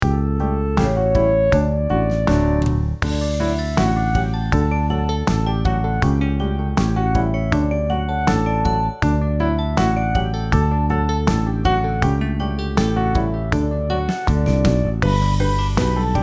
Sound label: polka